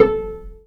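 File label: Cello pizzicato E; AKAI S-Series CD-ROM Sound Library VOL-2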